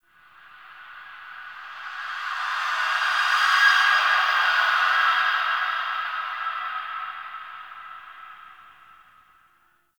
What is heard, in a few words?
Memory Echoes, Ambience; Warframe; The New War; Zariman